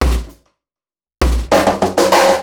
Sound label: Beat, Processed Drumkits; Event Horizon